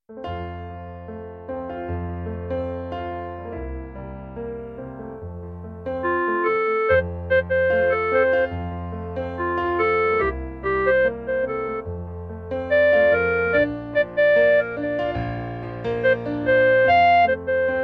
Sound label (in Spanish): CLARINETE